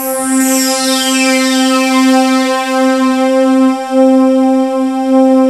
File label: SWEEP; Syntec; Wall of Sounds